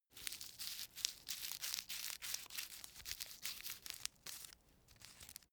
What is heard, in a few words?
cigarette roll